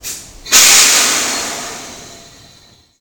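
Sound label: Emergency Brake, Train; OpenBVE; 103KCJ-LowerCab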